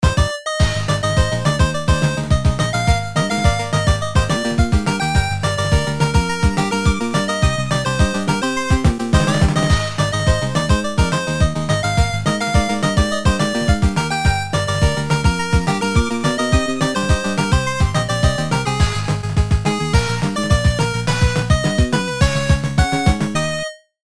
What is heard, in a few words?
8bit; Kekkai Sensen